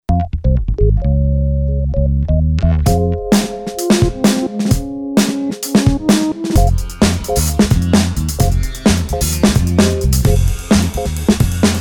question jingle

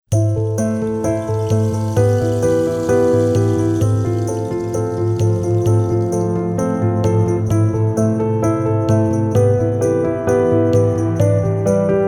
flying keys; des racines et des ailes

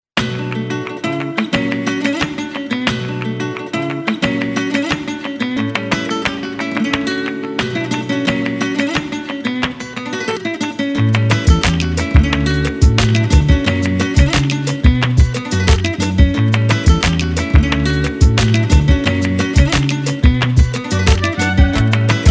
rumba flamenca

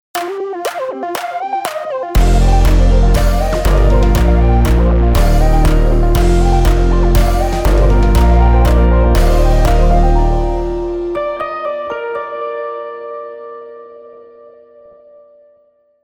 nice monster jingle

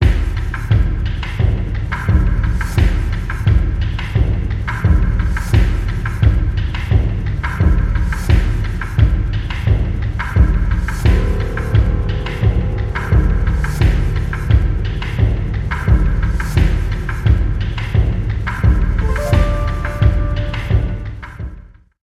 earth beat

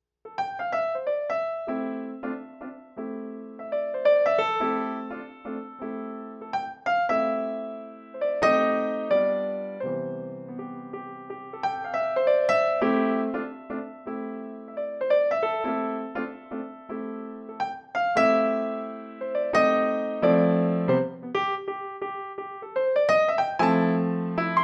piano club

